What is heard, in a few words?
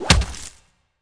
impac